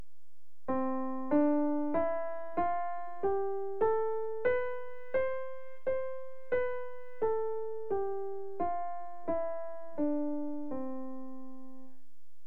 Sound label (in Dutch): Toonladder in C - Pythagorian